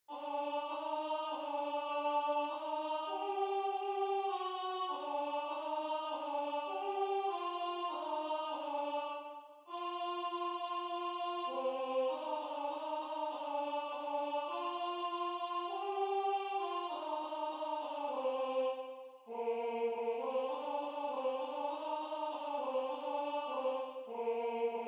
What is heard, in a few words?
alto, audio altos; ah plaignez tant